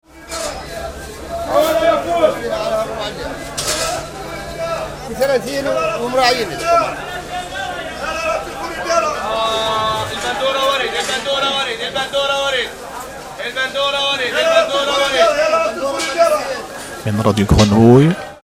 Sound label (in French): jingle expo grenouille